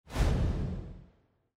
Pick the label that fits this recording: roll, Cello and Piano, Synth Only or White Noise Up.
roll